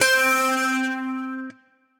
Drive Guitar; Guitar & Plucked; Ableton; Factory Packs; Drive and Glow

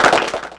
Clapbox Pitch; Ableton Foundation FreePacks

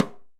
Timbales A L Mute, Latin Percussion, Drum Hits; Ableton; Factory Packs

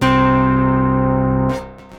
Guitar Multi String, Tension, Plucked; Instruments; Ableton; Synth Essentials

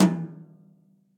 Tom Brush High Brush Sizzle; Drum Hits; Ableton; Factory Packs; Session Drums Club; MPE Hits

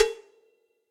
Cowbell Big Direct Natural; Drums; Drum Hits; Standard Hits; Ableton; Factory Packs; Session Drums Studio

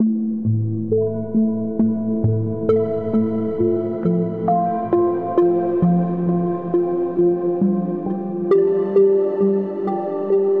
Plaintive Arps - Amin 67 bpm; MIDI Clips; Tonal; Motifs; Ableton; Mood Reel

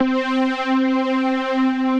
Air Space Pad, Synth Pad

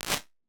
Tight Vocal; Experimental; Ableton; Convolution Reverb

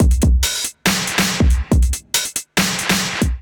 Kit 140 bpm, Drums Basic; MIDI Clips; Bow Road Kit; Ableton; Factory Packs; Skitter and Step

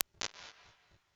Comb, Strange Places; Ableton; Convolution Reverb

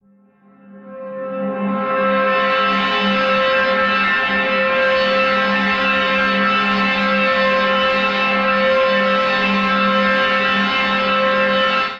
Buzzsaw Texture C 80 Bpm; MIDI Clips; Instruments; Ableton; Drone Lab

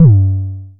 analog pitched, Kickbank; Ableton Foundation FreePacks

